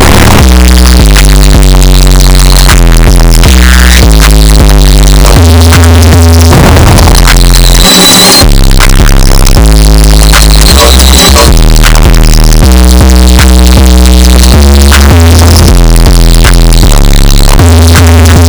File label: relaxing music estourado